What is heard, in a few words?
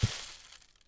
Foot Sand; Civ4 beyond sword